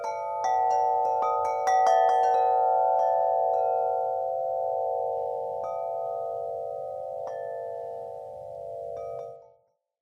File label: Aqua Tune, metal windchimes; 51in; Twilight series